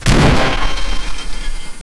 machine cannon reloading, machine gun

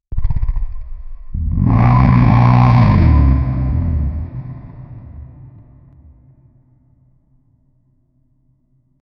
powerful jaguar roar deep

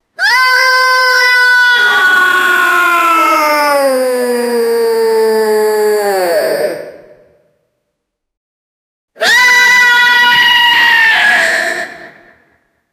goblins farting and; 100 goblins

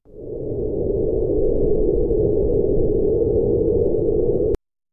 soft cold wind with a

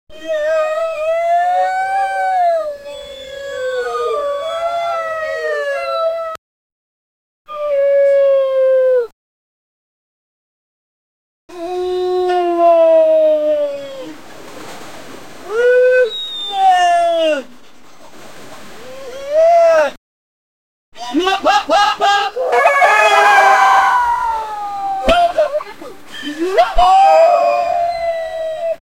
siren wail; emergency

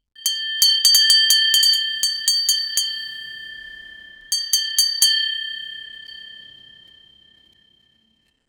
a loud ships bell that

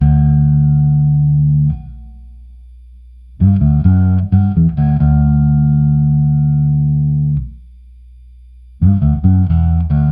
fender jazz bass bass gui

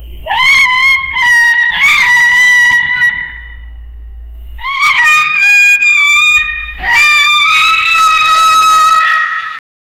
the monkey is crying agai

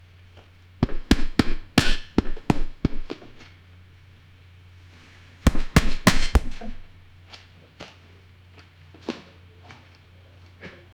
a realistic and intense s, gym

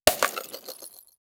satisfying tile break sound

